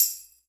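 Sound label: Hip-Hop Kit Tambourine; Roland Juno-D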